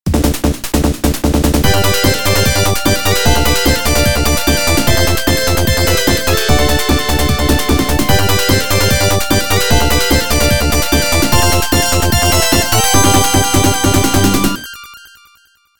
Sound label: title screen; sonic the hedgehog 3 knuckles